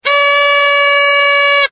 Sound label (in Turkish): korna düdük; korna siren düdük alarm zil sesleri